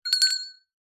bells small hand bell ring in water weird cartoon tone